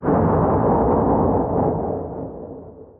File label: burst